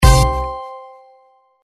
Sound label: win end